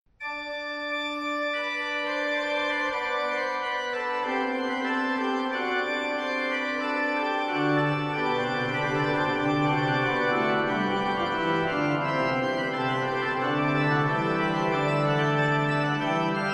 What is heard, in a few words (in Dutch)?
Orgelvers a3 c.f. in de Tenor